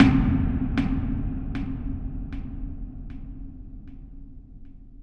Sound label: Suspension Ambient; Shadowgrounds Survivor